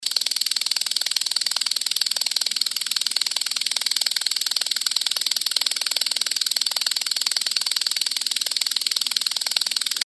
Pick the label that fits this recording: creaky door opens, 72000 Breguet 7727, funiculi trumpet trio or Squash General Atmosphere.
72000 Breguet 7727